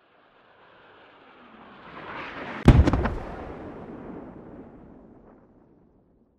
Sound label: mortar impact smoke 100m; gmod; better mortar